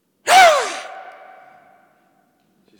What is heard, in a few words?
Spooky Scream